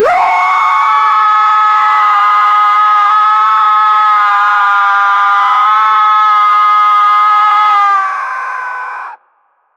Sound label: giant monster angler fish